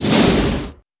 fast hit; RYL

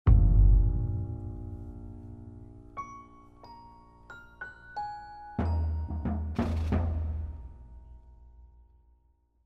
Music; Age of Empires III; cinematics

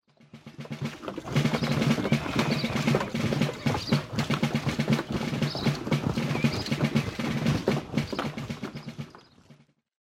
Marching; Age of Empires III; cinematics

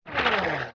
Cannonball Incoming; Period Combat; Sony Pictures Sound Effects Series

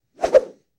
Arm Whoosh Multiple, Hand To Hand Combat; Sony Pictures Sound Effects Series

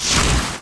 dispel; RYL 2

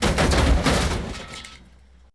Vehicle Impact Moderate; Sony Pictures Sound Effects Series; Vehicles; Crashes

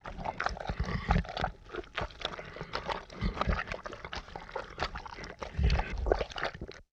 bubbly slime ingestion; Sound Design - Horror; Sony Pictures Sound Effects Series Volumes 1-10; Sony Creative Software

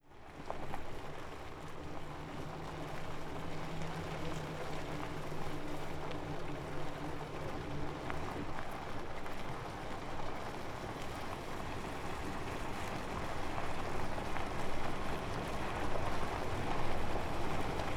Tires On Gravel Steady; Sony Pictures Sound Effects Series

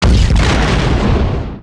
Hell Fire

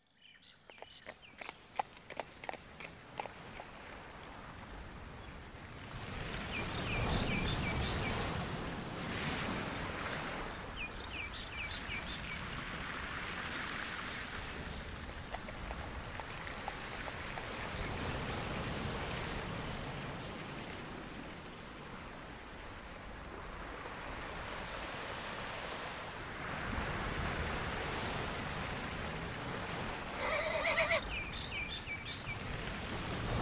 Ambience

